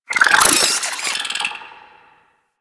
Infested Weakpoint Vocal Chatter; Warframe

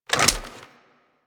Flak Cannon Unequip; Warframe; UT Flak Cannon

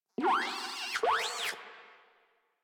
Moa Compassionate Vocal Reward Praise; Warframe; Pets

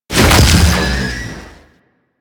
Projectile Fire; Enemies; Abilities; Sentient Brachiolyst

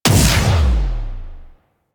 Melee Heavy Air Slam Impact; Weapons; Whooshes; Warframe

